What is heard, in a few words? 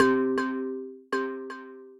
Mallets, synth keys; Ableton; Factory Packs; Vibrations; ModeAudio; Bouncy Castle